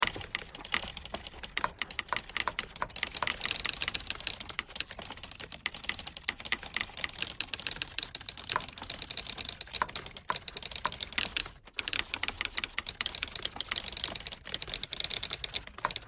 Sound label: typewriter thread all